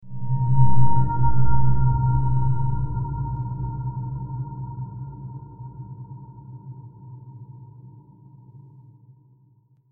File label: Stinger